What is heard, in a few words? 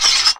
sword; Sonic Foundry (Sony Creative Software); Ugly Remnants VOL-2; Beatstuff